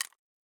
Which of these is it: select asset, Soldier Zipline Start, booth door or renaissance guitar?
select asset